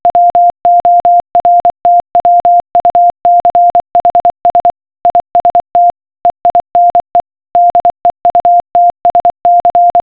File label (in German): Morsecode